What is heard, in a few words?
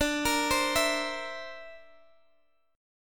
D 7th Suspended 2nd Sharp 5th, Guitar, Open G